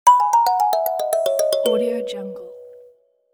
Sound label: childrens kalimba musical transition